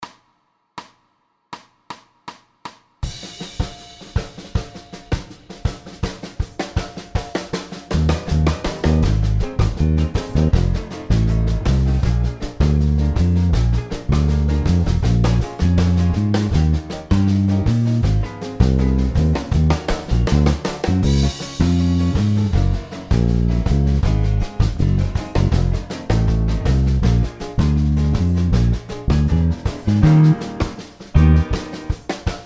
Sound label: Jazz HORNS Play Along; Alt Style; LMEA All-State Jazz